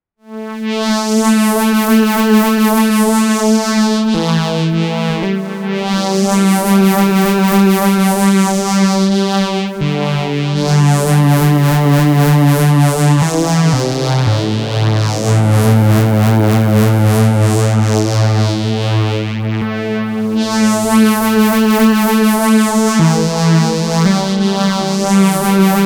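MOOG MAVIS Chorus Phaser Pad with RE 301 Stereo Chorus Echo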